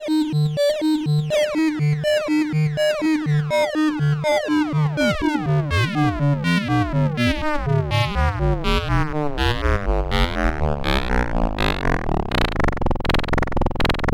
Erica Synths Pico System 3 FM

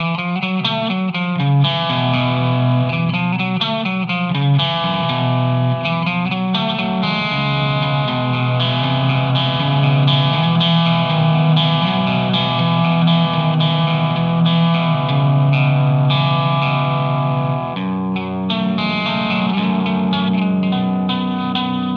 Single Coil Mode; Walrus Audio Ages

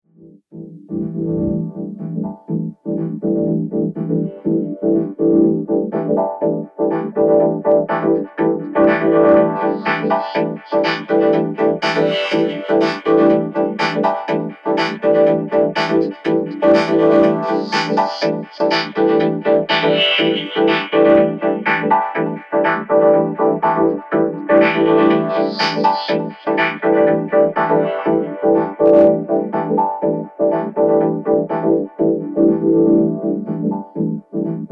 line in drumloop; micro freak

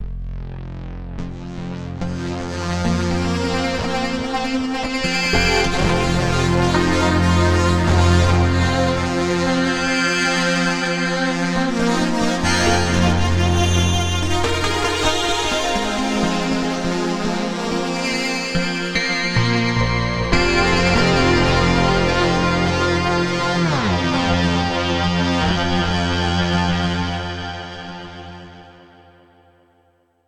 Mixed FM; Groove Synthesis 3rd

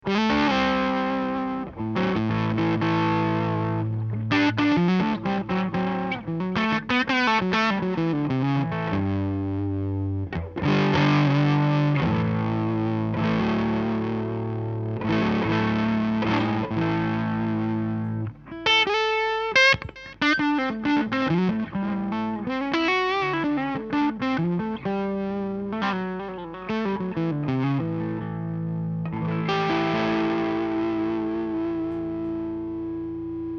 smooth tube

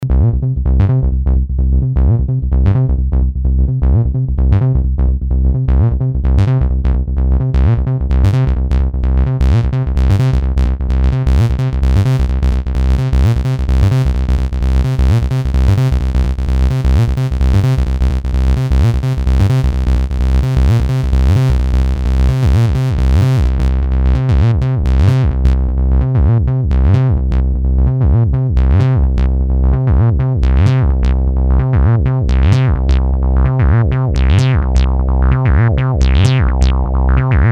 arp korg z1

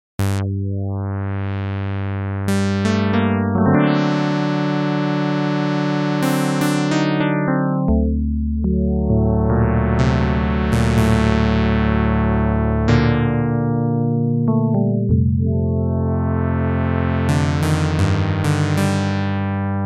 electrax FM Saw Add

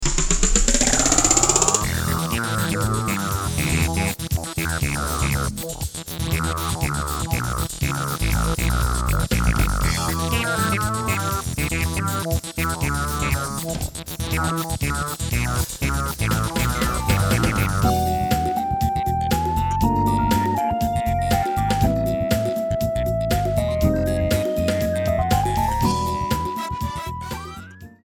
Pop

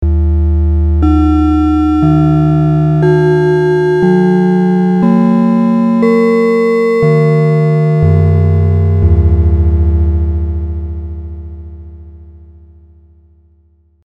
logbells

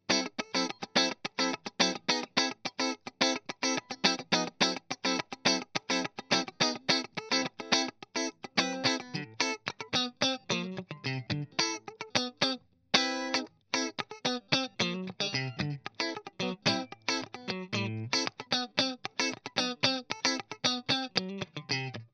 Strat bright

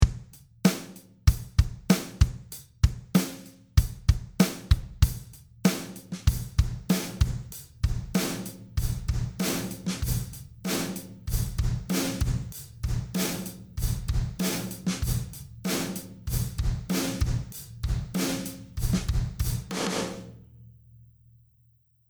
Oxford Envolution less Transients more Sustain

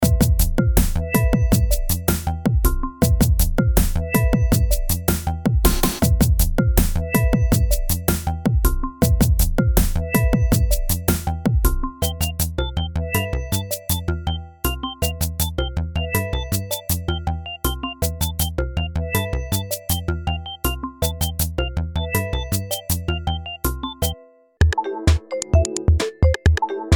Tenori

